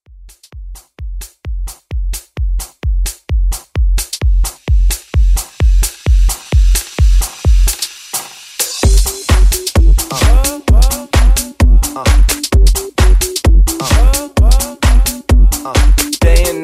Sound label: Pop House Mix 130 BPM